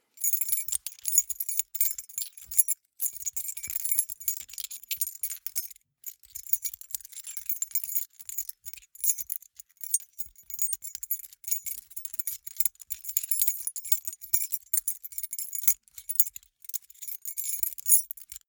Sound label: key ring small rattle sequence hard